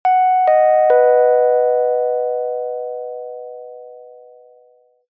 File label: public places announcement tone bell dry